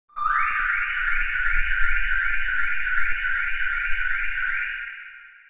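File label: alarm, zones city; Zoom3City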